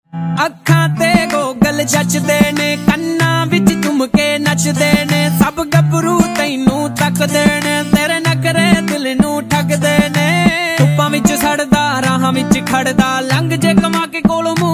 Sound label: Punjabi Beats Ringtone